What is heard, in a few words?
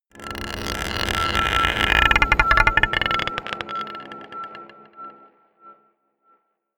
scrapes marble pot dark, drones